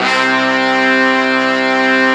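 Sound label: Brass Ensemble, Roland; Club-50 - Foundations Roland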